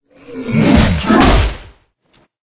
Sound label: orge attack; monster